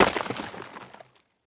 ice break; teardown ps5 windows xbox series xs gamerip 2022

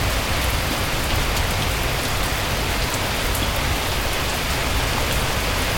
Ship Rain; Jack Axe

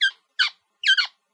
Quail Man Attack

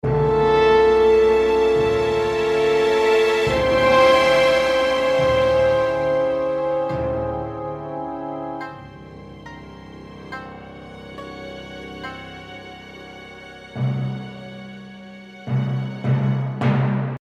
Danger Stinger; Shuyan Saga

